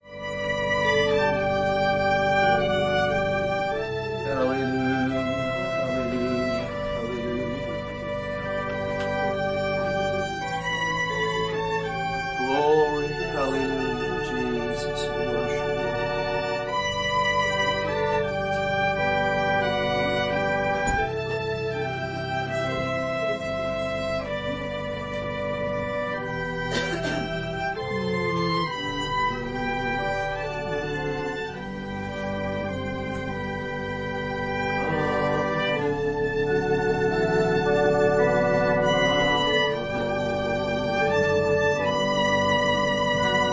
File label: Organ and violin prelude